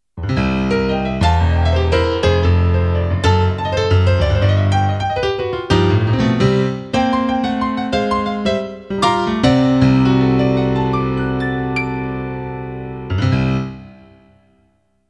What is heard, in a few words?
yamaha ex